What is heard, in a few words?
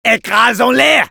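battlecry, French; Medic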